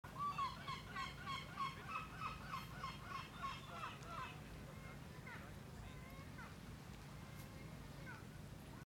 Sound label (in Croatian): Galebovi